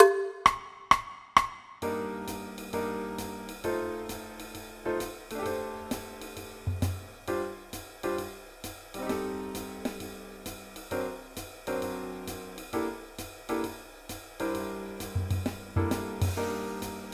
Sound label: Bass, Jazz; Honour Bands